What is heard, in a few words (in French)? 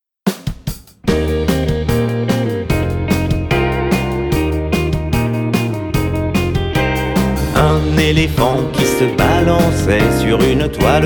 comptine rock Elephant